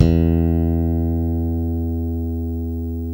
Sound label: JAZZ, JAZZ BASS